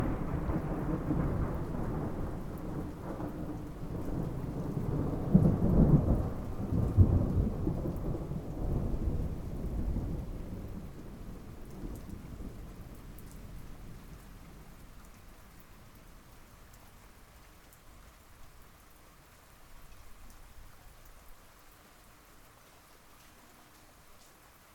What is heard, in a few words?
thunder